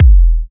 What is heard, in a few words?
tight low end kick single hit f key; drum single hits one shots; kick drum samples